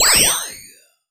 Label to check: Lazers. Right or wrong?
Right